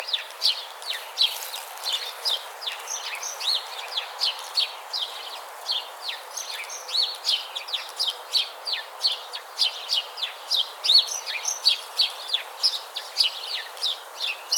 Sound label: House Finch Haemorhous mexicanus